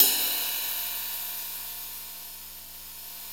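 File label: cymbal ride, Roland; Club-50 - Foundations Roland; Ext.Snare 3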